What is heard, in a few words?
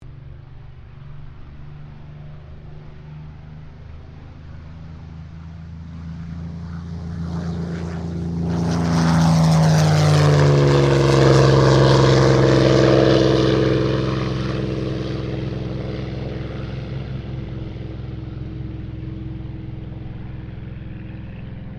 AIRCRAFT SUPERMARINE SPITFIRE MK-XVI TAKEOFF STEREO; Airplane Sound Effects